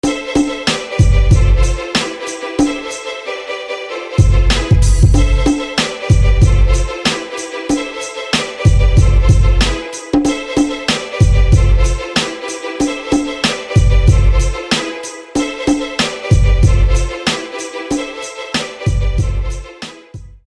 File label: String Hit; St Pauls String Hit; SFB FX